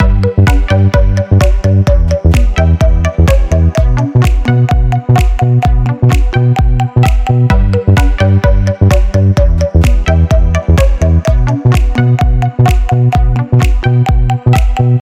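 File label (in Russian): техно космос крутой электронный мотив без слов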